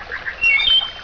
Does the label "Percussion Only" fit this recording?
No